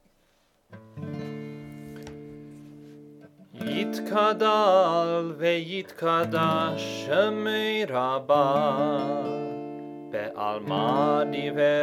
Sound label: Chatzi Kaddish Low Voice; Hebrew language